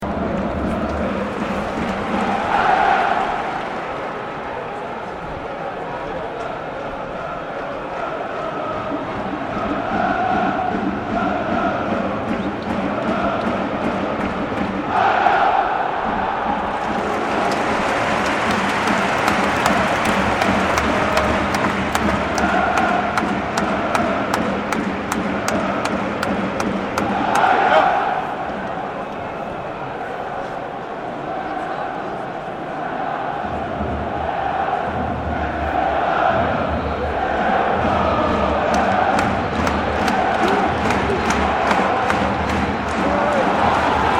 crowd at bayer leverkusen vs real sociedad champions league game, leverkusen german; germany